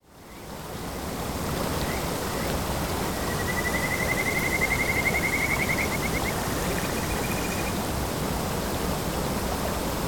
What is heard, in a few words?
kajika frogs singing in stream